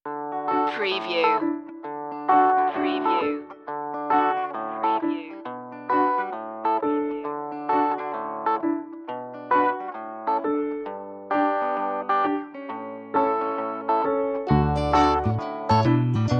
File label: wishing tree no lead